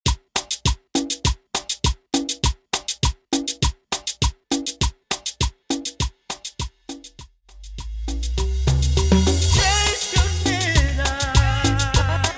Intro Break Outro 101BPM

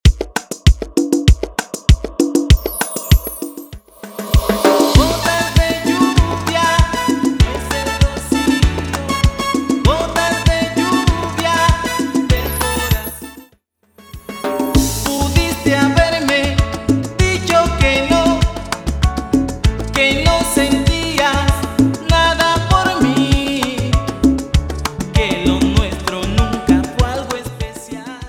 intro 2 directo 98 bpm

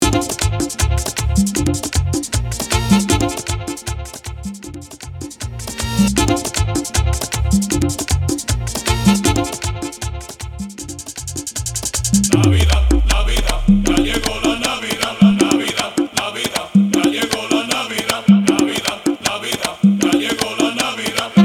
Intro Outro Break 156 BPM

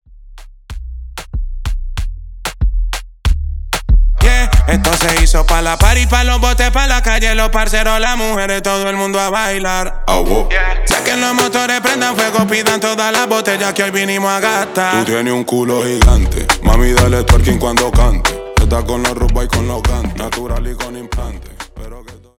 Sanka Intro Verso 94 Bpm

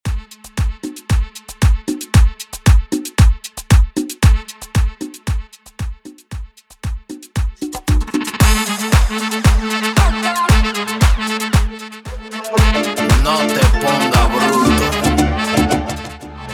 Intro Outro 115 BPM

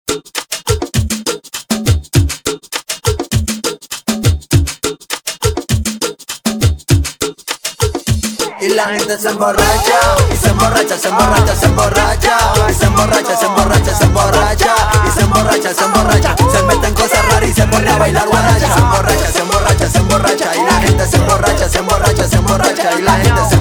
remix bpm 101

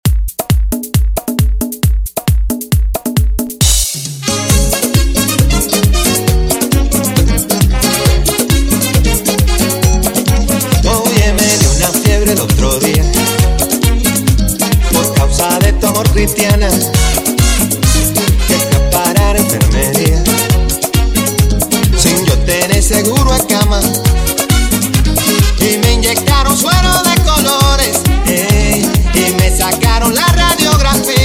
135bpm, Intro Outro

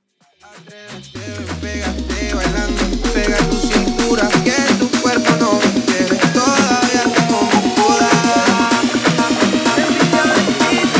afro remix 127 bpm

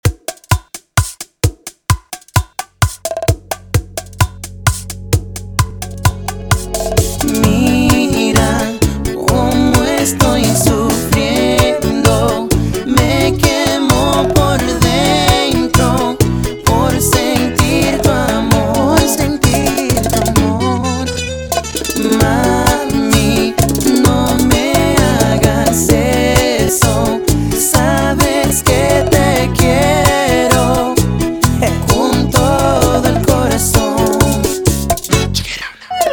intro outro 130bpm

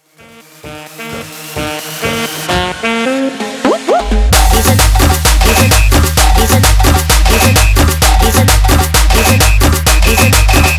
mashup 130 bpm

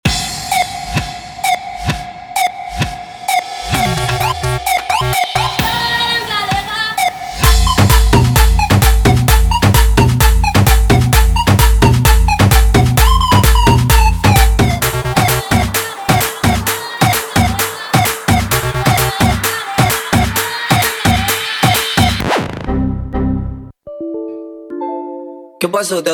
bootleg remix 130 bpm

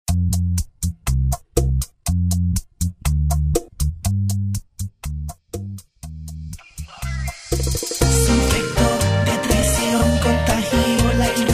Intro Verso 121 Bpm

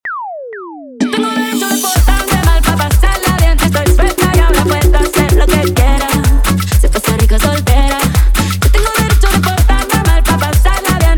intro outro 126 bpm

